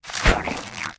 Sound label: WAR ATTACK